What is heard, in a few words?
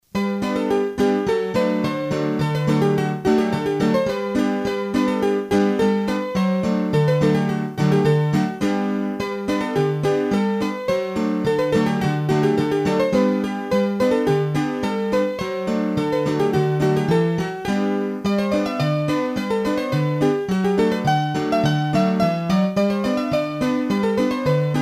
Polka, Poitou, hurdy gurdy; Polka Batafre